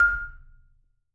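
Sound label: Marimba medium F F5, marimba; Sampleheads - Dave Samuels Marimba & Vibes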